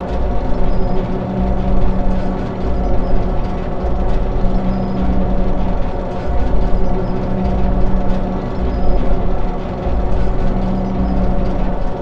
Shield Generator

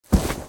Pet Cat Bound; creatures pets

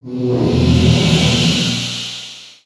breath moan; ninjago

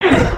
run, creatures organic

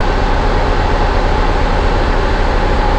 Maelstrom Engine

